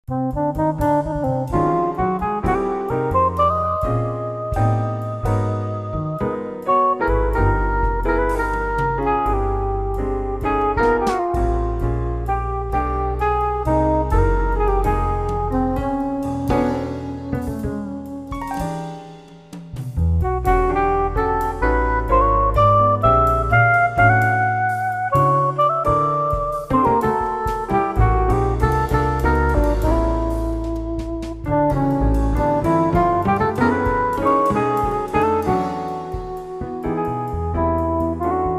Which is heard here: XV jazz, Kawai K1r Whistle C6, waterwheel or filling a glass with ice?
XV jazz